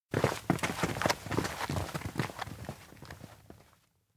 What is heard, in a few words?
steps outdoor town leave; anemoi